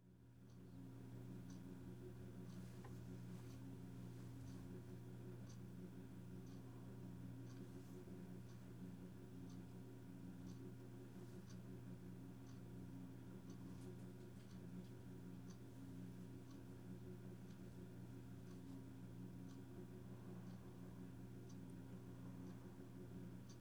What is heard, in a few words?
lullaby